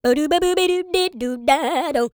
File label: SCAT, Jazz